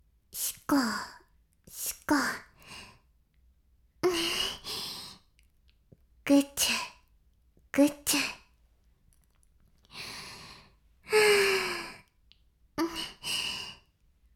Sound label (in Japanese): しこしこ音声, ASMR; 白くて黒い魔性円環